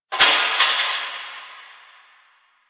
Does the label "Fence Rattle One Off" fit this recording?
Yes